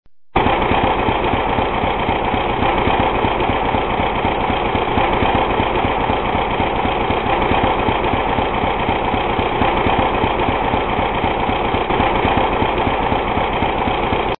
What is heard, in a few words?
1942 john deere tractor putt putt